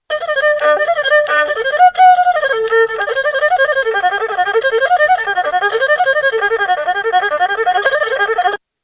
erhu; instruments

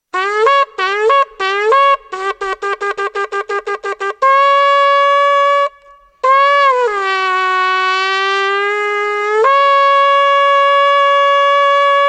shofar

